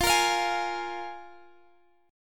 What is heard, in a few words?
F Minor Double Flat 5th, F, Guitar, Drop D; x,20,20,22,21,x; down; chord shape